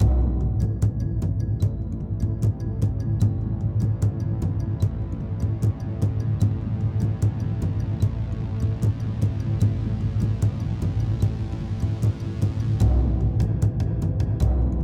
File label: cave, music; Manamon Music; Manamon 1